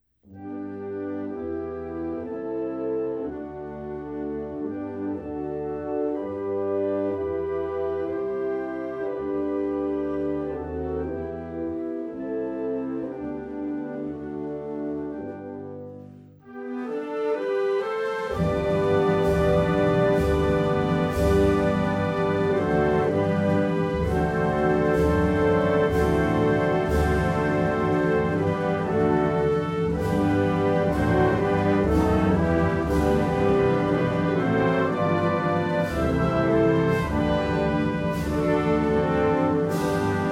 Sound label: god save the queen instrumental